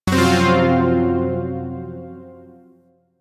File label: Shawzin Hirajoshi Chord G; Music; Warframe; Zariman Shawzin